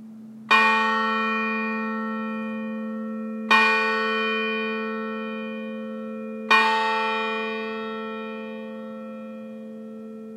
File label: angelus bells